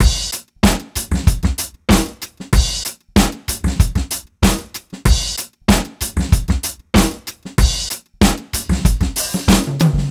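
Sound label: 95 bpm, beat loops; Zero-G; Monster Beats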